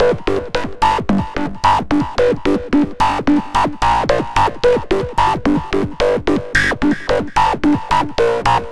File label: Reso Funk; Dr. Fink's Funk Factory